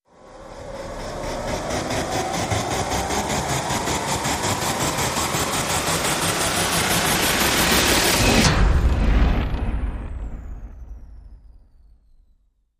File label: Rise Long Maintain Control